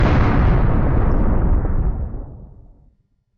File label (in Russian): udar o zemlyu oglushitelnyiy vzryiv; zvuki meteorita asteroida